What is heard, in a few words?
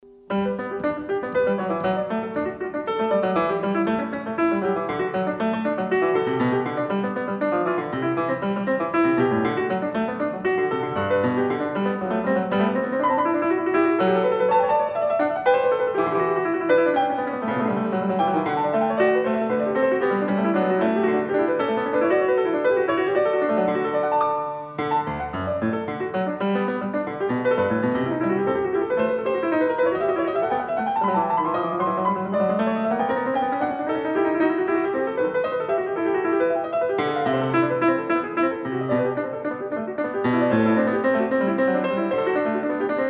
Bach Goldberg Variations